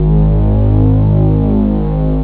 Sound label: bass, synth bass